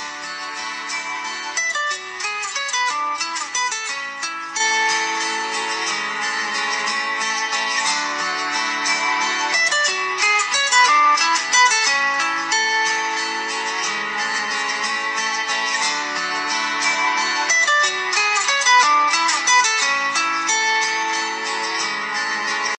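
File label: nokia 6700 classic ringtone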